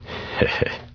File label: hehehh